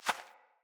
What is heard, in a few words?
Rat Guide Step, Foley